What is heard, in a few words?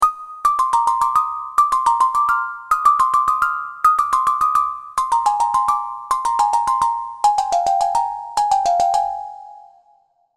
serum plucks; chiqui audio